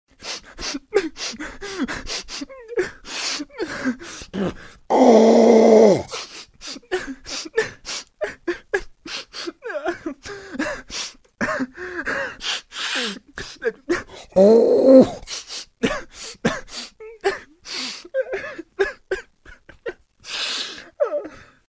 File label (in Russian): Звук плача мужчины при этом сморкается